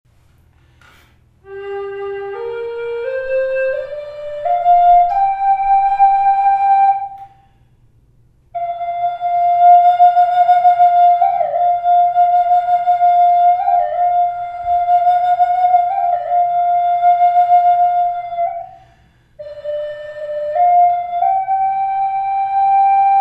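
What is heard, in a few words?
reverb, flute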